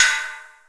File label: SDS RIDE CUP, Drums; Best Service; Real Mega Drums VOL-1; SDSV KIT2 GM